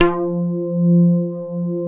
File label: SYN ORGSY, SYN DIGITAL; Sound & Vision - Gigapack I CD 2